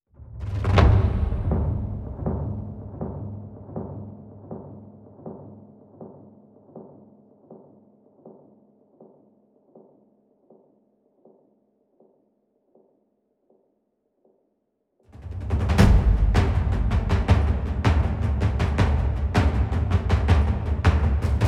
low drums only drums; schallenberg engineering

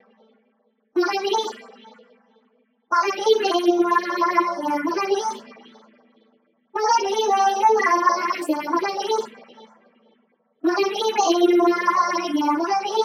vocal resample; wavefield

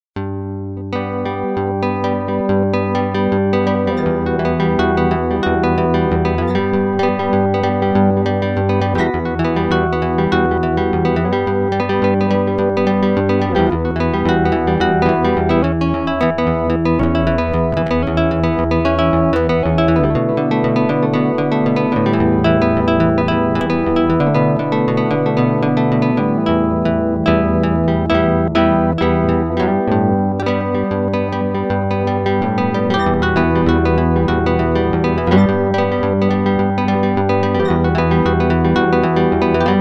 guit middle

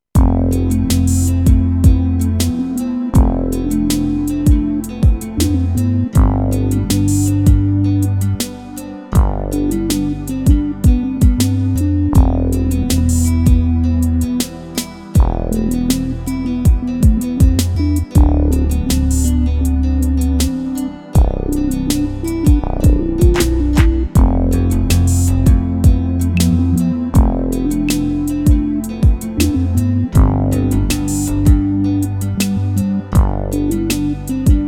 QY100 chill

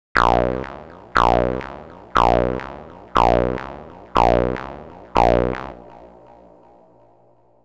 gate 16 steps robots; the meditation soundset

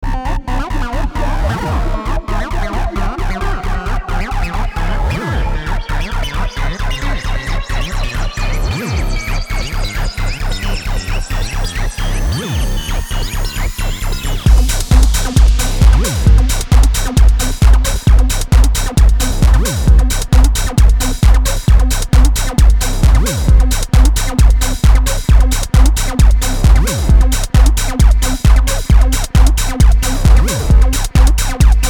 with drums; audioblast

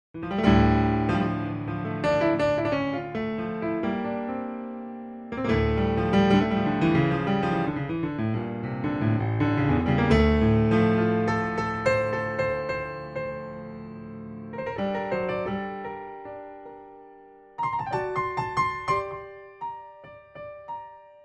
massive impro, f grand; f grand 278; uvi

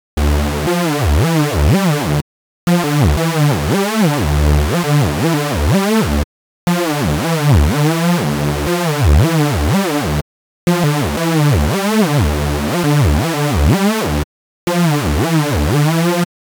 saw synth

